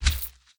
movement step; smite; god sobek

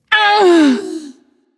grunt lift; smite; voicepack; nuwa

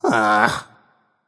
nv ugh; smite; voicepack; ganesha

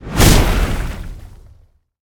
explode; smite; god sol

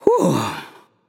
nv sigh; smite; xbalanque

